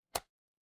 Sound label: ui toggle